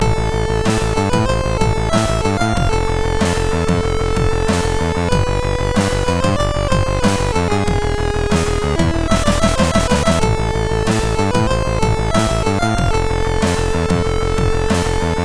Faces intro, MOS6581R2

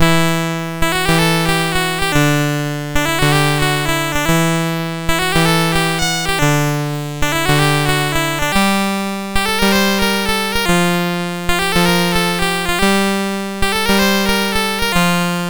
MOS6581R4, sid; Cyberworm

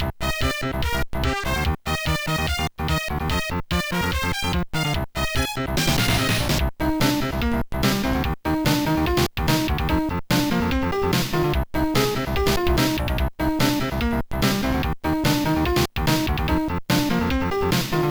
BopPop song

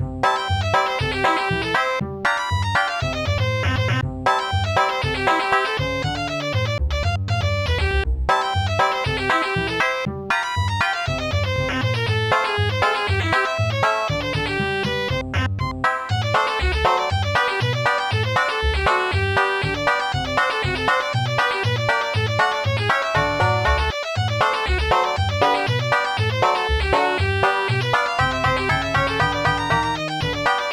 strumming banjo rag; Instant Music

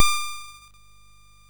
sid MOS6581R2; Magic Serpent

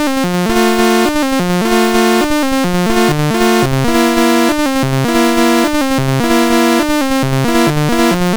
sid MOS6581R2; Quicki BASIC

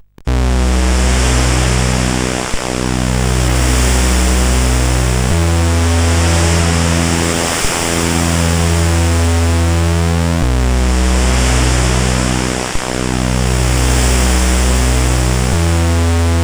First Shower tune,sid CSG8580R5

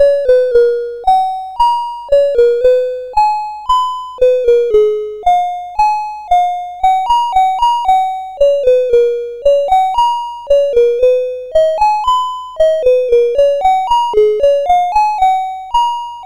sid CSG8580R5; Ocean Conqueror

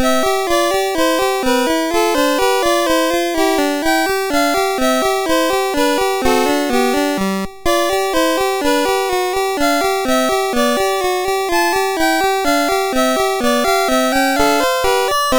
sid MOS6581R3; Chiribiduf

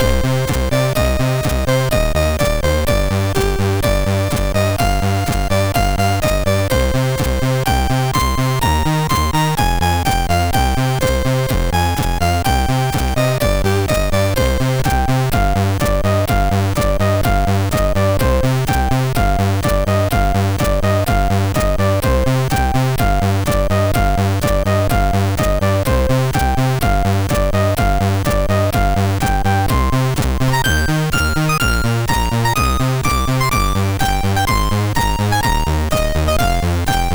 sid, MOS6581R2

